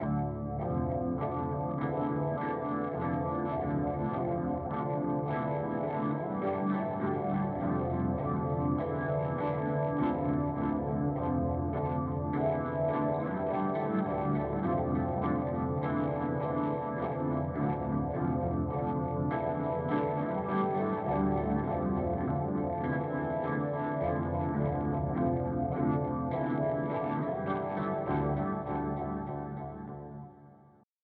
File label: Amp Octave Delay; Boss GT-1000 Core